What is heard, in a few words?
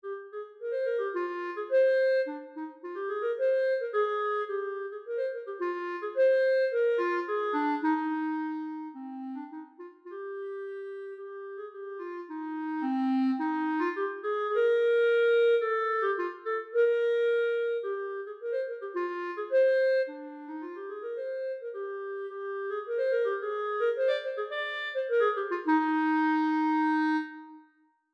Clarinet